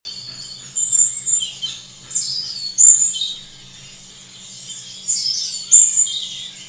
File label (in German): rotkehlchen, rufe vögel; voegel